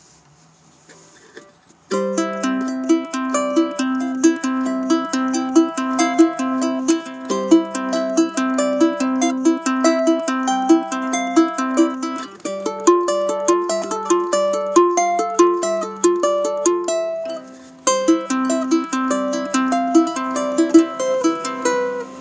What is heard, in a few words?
Finger Picking Challenge Etude; Rock Class 101